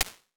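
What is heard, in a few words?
live vocal booth; Ambience and Small Rooms; Ableton; Convolution Reverb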